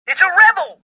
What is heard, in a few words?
anger; worker1